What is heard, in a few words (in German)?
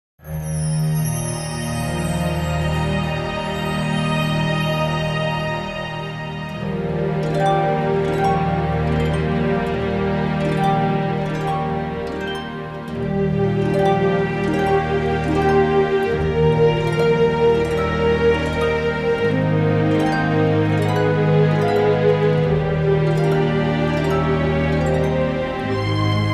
Harfen